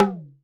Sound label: Released, Alesis D4